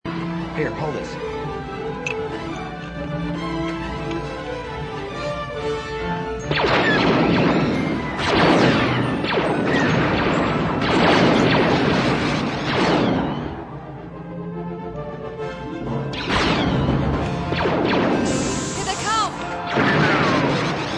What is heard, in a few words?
blaster; ANH; DVD